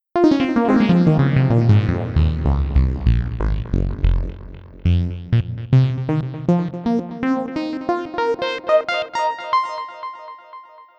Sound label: electronic basic bass sound